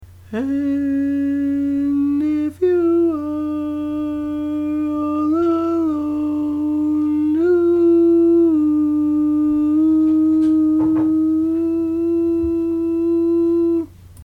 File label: Lead, barbershop